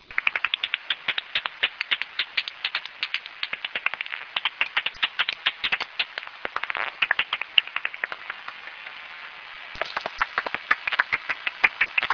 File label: pipip; cris d'écholocation d'une pipistrelle commune; Pettersson D200 réglé sur 49 kHz; Hamois, Belgique